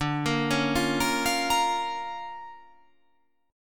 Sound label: D Minor Major 7th Sharp 5th, D